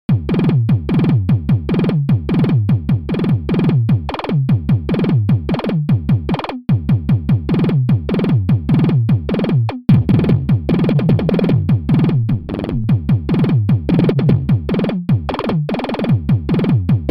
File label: kdrum